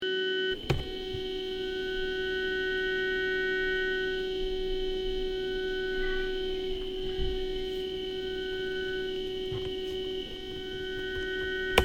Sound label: beep goes adrianos headset